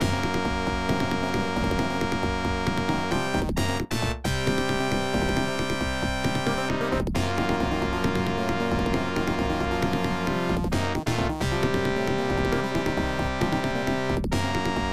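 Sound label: background music; Street Fighter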